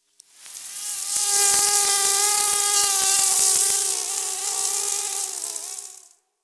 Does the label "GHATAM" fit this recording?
No